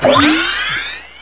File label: fludd nozzle; GAMECUBE; SUPERMARIOSUNSHINE